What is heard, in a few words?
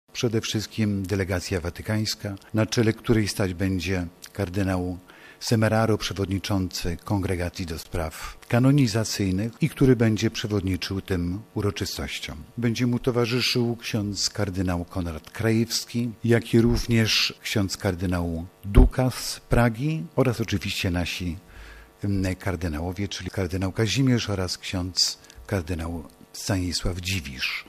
beat